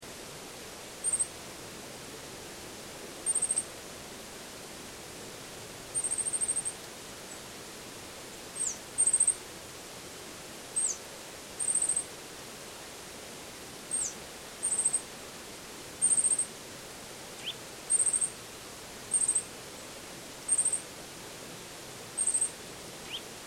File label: Ambience Sannohe Air Birds, Ambiance recorded in Sannohe Japan; Bird Sound Effects; Birds (Sound Effects:Animals); Foundation1